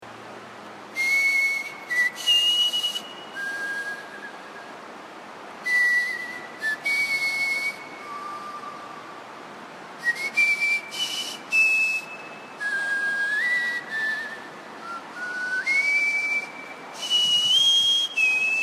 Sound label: mystery hill whistle